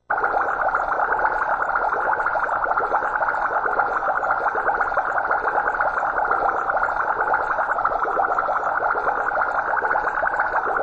Boiling Low, The sound effect of a cauldron boiling and bubbling.; Kitchen; Household